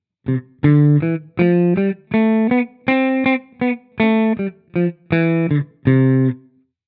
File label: stac tenu